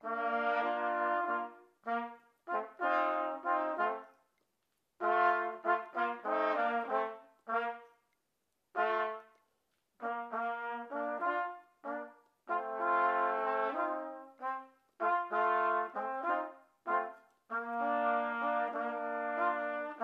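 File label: trio with percussion; samba lele; toe excellence in chamber music bk 1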